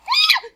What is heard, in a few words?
Squeal Thing